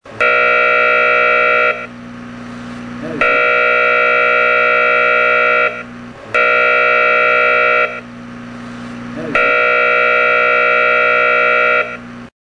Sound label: all Clear